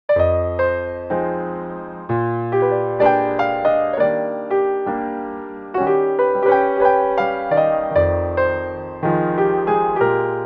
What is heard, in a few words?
SOLO PIANO